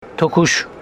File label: cough; Homshetsi